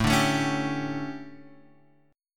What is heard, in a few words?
D Major 7th Suspended 4th, chord